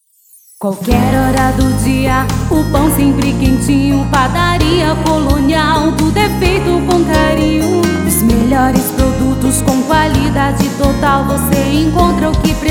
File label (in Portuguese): Jingle Padaria; Colonial